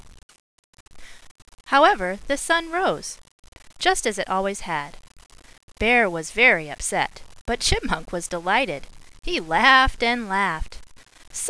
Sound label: bear